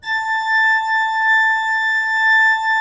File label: 16-8 A5, Cathedral Organ; Propeller Island